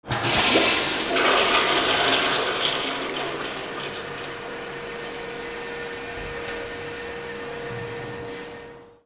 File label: BATHROOM